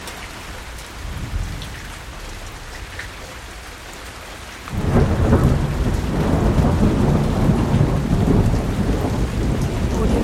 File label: weather rain gentle thunder rolling loop